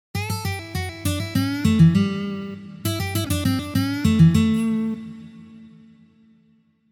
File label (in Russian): Blyuzovye liki i uprazhneniya